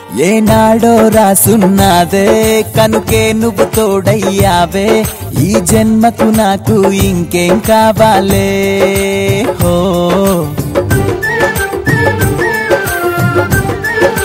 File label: Telugu Ringtone